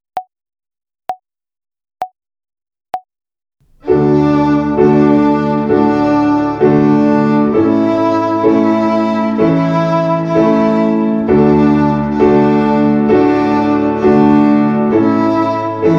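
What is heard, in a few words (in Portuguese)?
Ginástica para o dedo 1 - Corda RÉ - Quaternária, Violino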